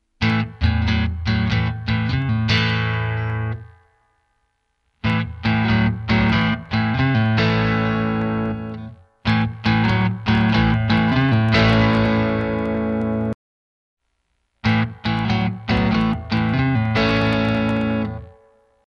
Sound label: ts rhythm